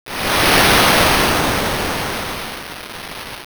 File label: wind